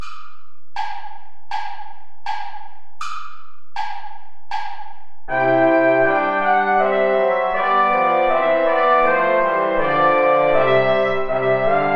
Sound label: play along without trumpet